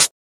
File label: natural pedal hi-hat e key, hihat tuned to the E note